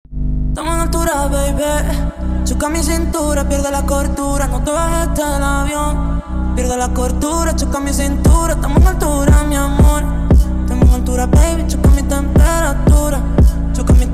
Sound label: Funk slowed Version